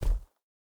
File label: bear footstep dirt hard; Rust